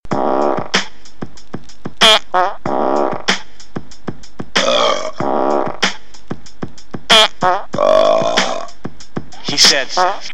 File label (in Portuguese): rap do peido e arroto